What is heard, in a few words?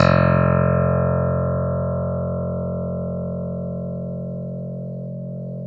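clavinet; Roland LCDP10 Keys of the 60s and 70s 2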